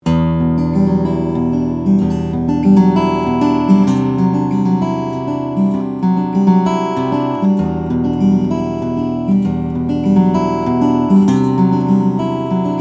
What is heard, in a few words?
Rain Song, guitars steel string flattop; 25yr anniversary special; carbon fiber